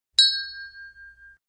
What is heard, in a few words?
Glass Ding Crystal Champagne Flute Toast; Household